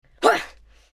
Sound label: Female woman grunt karate judo or martial arts